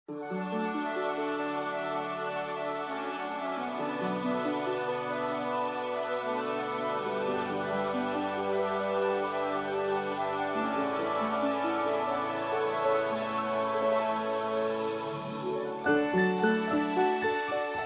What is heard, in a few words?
Theme Song (Piano)